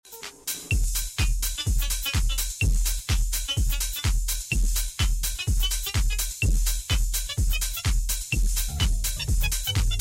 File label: rubber jazz band; Dance Mania